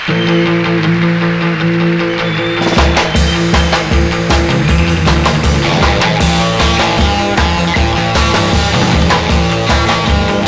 rock inst

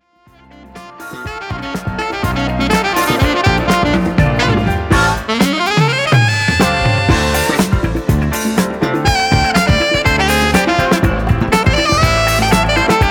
jazz fusion; フュージョン（レーザーターンテーブル使用）; ジャズ・フュージョン(24bit・96MHz)

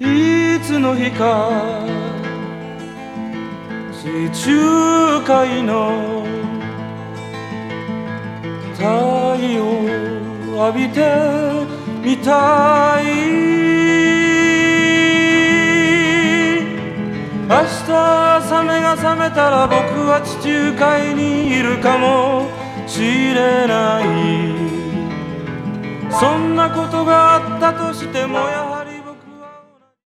folk japan

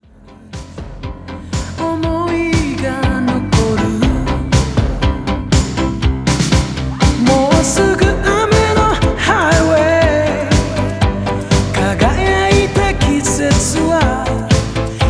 male vocal; 邦楽・男性ボーカル; 男性ボーカル01

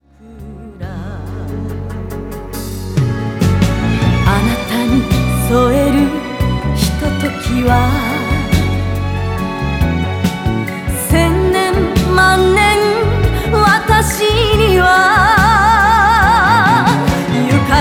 enka woman

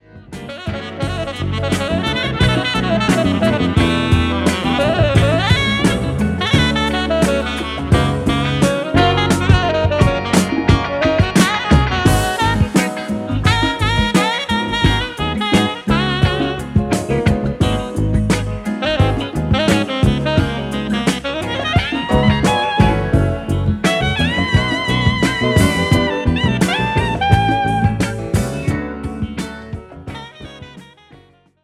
fusion sax

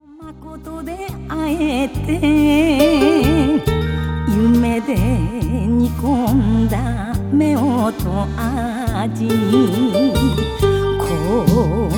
woman enka

